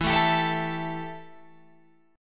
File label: E Minor Double Flat 5th E Guitar Open F; Embb5 strummed; 16,16,19,16,19,16